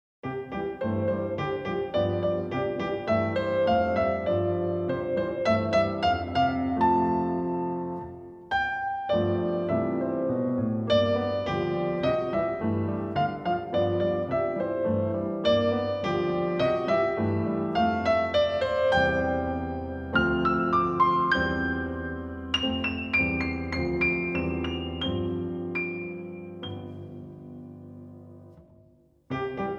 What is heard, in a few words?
piano